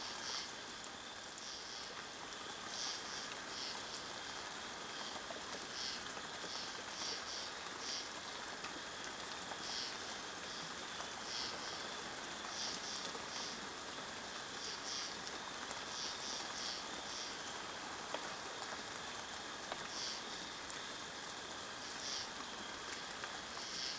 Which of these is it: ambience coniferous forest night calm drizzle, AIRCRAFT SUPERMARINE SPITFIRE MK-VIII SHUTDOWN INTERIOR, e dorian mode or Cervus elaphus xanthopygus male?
ambience coniferous forest night calm drizzle